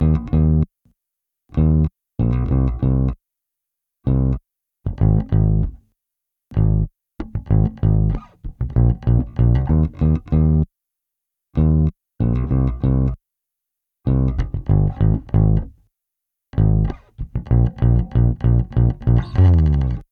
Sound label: futzd bass; mcdsp